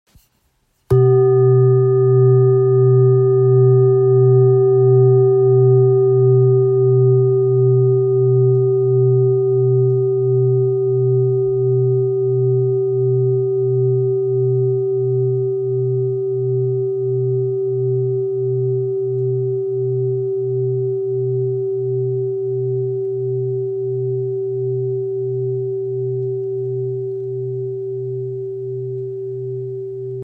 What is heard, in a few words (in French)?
Bol gravure bouddha si 2.68kg